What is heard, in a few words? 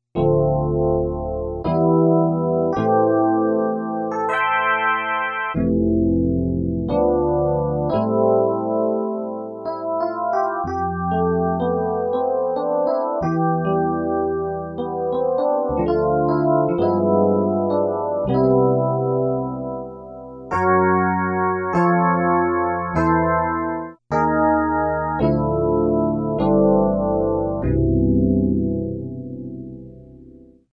E Piano, Casio CSM-1; Tones